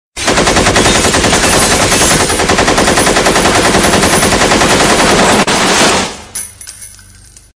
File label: Machine Gun